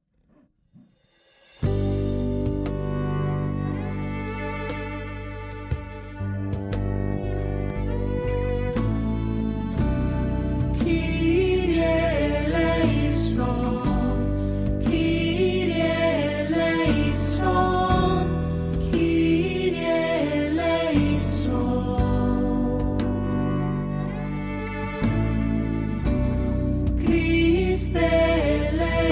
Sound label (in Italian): kyrie eleison voce soprani e tenori acuta